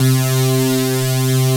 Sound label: METAL KEYS; Pro-Rec - Synthsonic